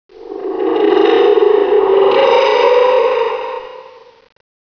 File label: cave